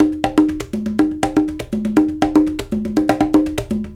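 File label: 120 bpm, Congo; Spectrasonics; Supreme Beats; World Dance; Isle Congo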